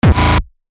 kick hardstyle

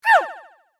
throw; pink mew

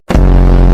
transition brrr

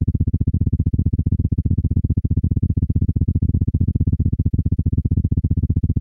heartbeat random

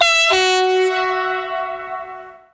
two tone train horn; sncf

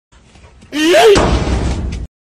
yeet bonk

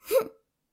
hmph

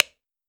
click

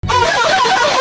loud ahh

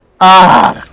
mxahhh

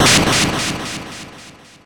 barrier break

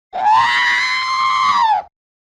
uaaaaaaaaaaau

